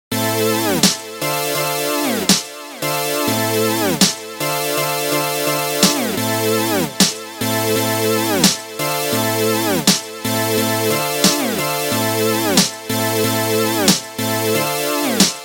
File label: Synth Trap